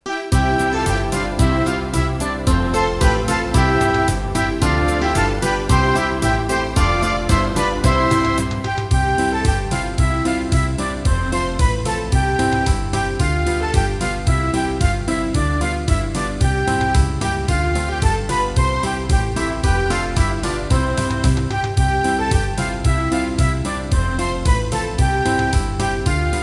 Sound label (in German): zirkuslieder playback